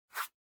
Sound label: Cell Phone Hardware Swipe